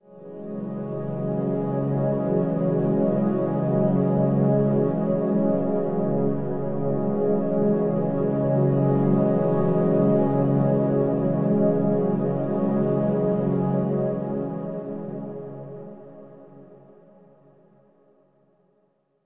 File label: Overlay Drone